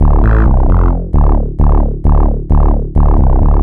bass, dance

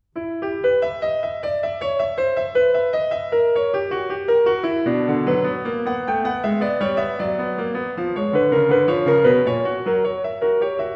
Fugue No. 10 in E Minor, BWV 855; Bach; The Well-Tempered Clavier; Book 1

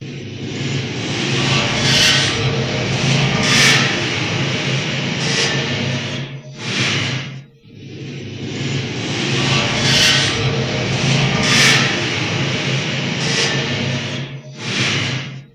Metal Scrape

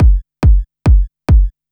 Kik Loop, Dance, Beats

